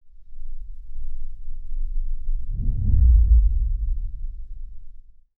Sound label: Progressive underwater muffled sound